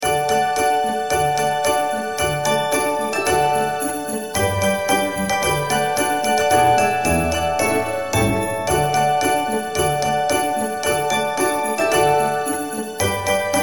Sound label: jingle bells